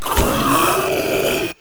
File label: Proto Infested Ancient Vocal Pain; Warframe